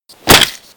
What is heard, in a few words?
Face Punch